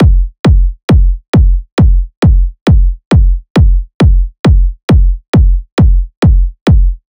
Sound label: Kick Loop 135, Techno Drums